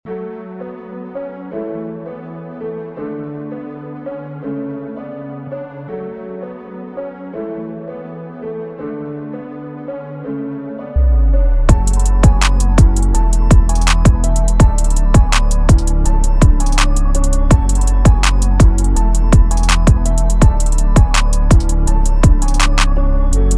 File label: beat